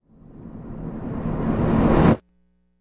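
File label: sounds of parachute jump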